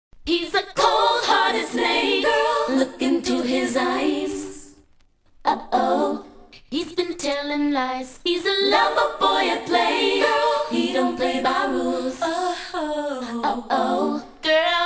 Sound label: acapella